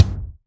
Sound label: Dnb kick